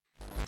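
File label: Teleport Power Off Reverse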